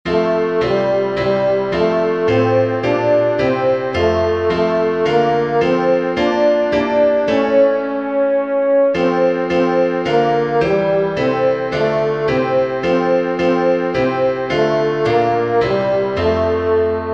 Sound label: st flavian tenor